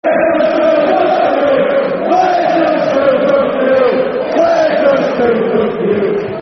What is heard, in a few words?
We're Just Too Good, Football Chant (Soccer Song); Doncaster Rovers Drfc